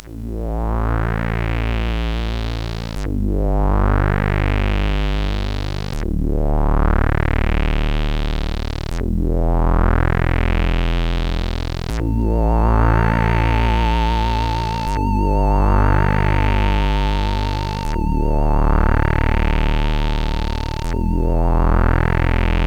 Bells, sid, CSG8580; CSG8580R5

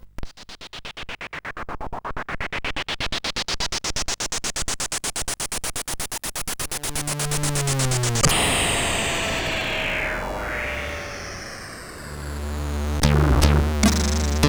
Military, CSG8580R5; Deek

